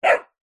animals dog puppy several weeks old single bark ridgeback cross bullmastiff